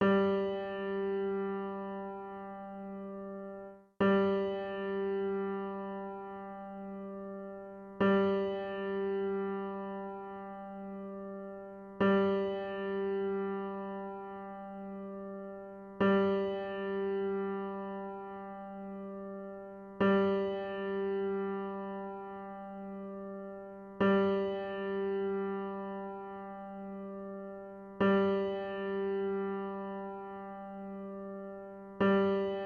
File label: piano G